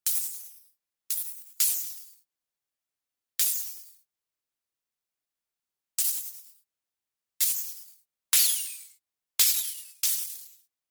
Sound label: SHORT CIRCUIT